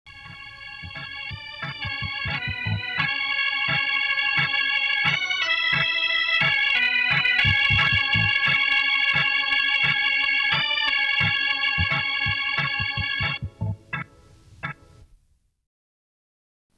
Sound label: Organ Skit